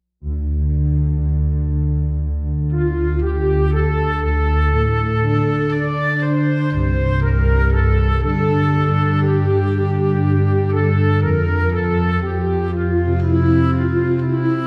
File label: Fragmented Flute